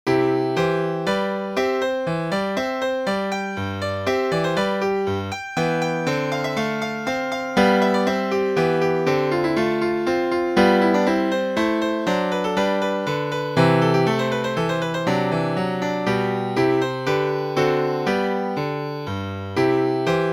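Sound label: Hornpipe, piano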